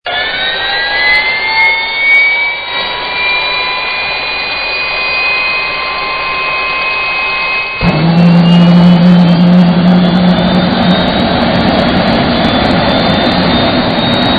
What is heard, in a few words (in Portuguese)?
Turbina; Vinhetas